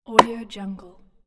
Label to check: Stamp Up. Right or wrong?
Wrong